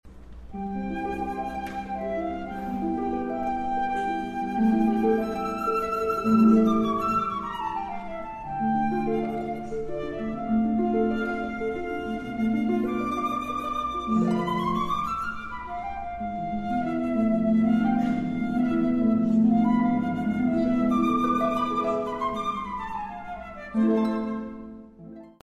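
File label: Flute Harp, Flute and Harp Concerto Cadenza; Mozart Flute and Harp Concerto Cadenza to Movement #3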